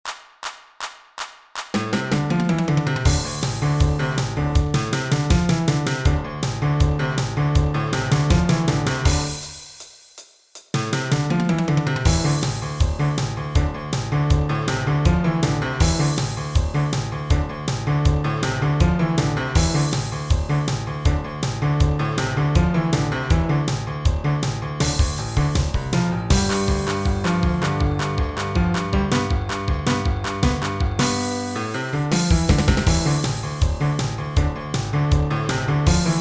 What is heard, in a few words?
drums